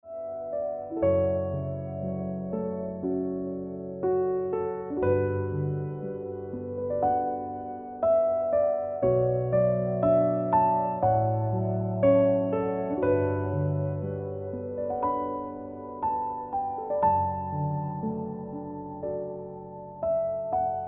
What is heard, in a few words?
Piano Music